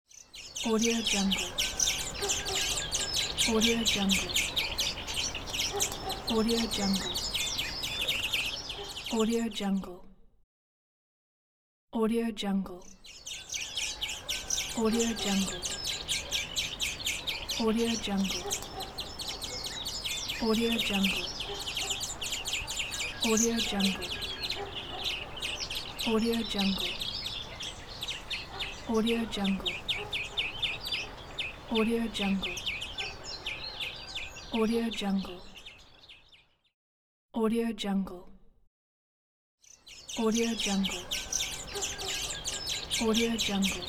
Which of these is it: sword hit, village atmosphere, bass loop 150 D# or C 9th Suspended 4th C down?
village atmosphere